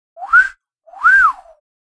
Wolf whistle whistling at an attractive person catcalling